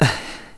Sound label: Stretch; Link stretching